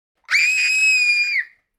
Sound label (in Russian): visg detskiy